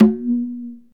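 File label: high conga, Latin; Roland L-CD701; PRC Latin 1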